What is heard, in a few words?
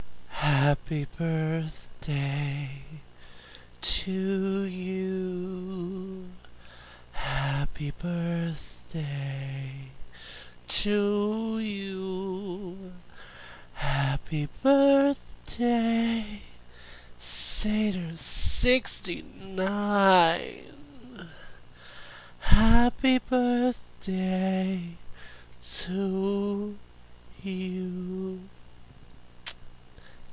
sing happy birthday